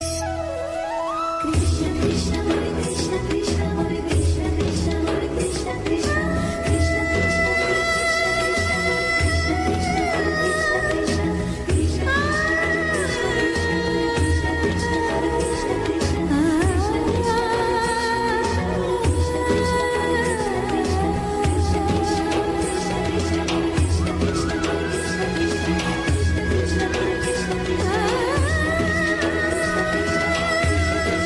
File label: Krishna Instrumental